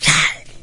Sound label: melee, maggot; doom3